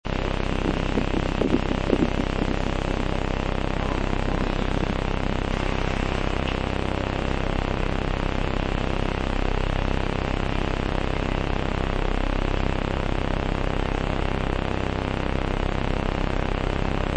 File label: sawtooth